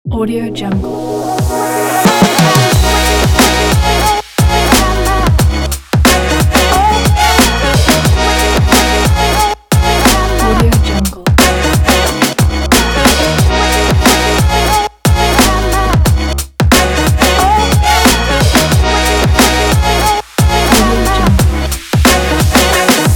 hip hop travel

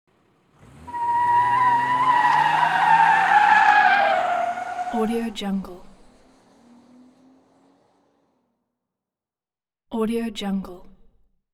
automobile accelerate quickly skid

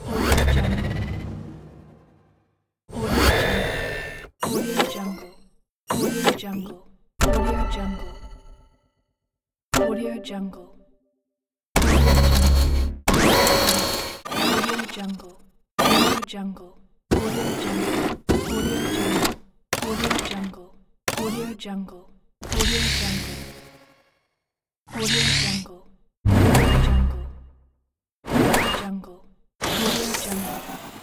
user interface mechanical pack; inspectorj

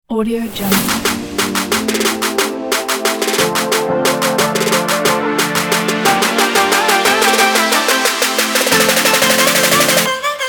uplifting future bass kit

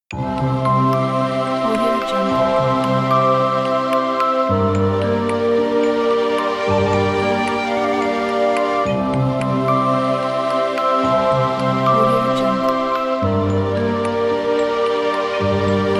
magic tale

